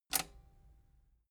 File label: metal button robust spring push enable